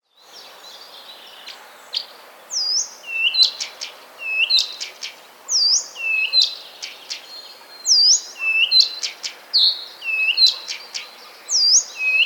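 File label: garden warbler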